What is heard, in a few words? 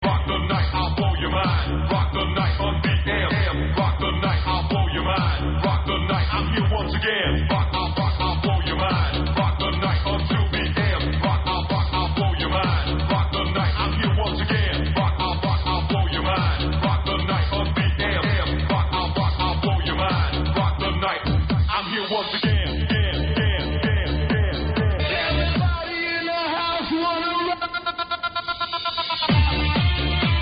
old techno